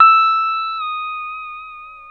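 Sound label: pedal steel bend down E6, Left; PEDSTL BENDS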